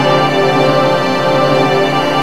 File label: ENSONIQ ORCH TONE CLUSTER